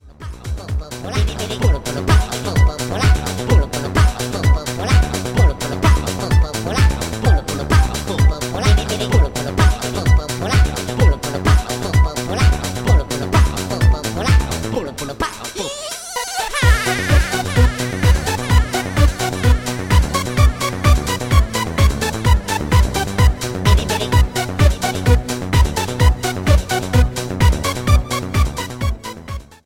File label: cartoon band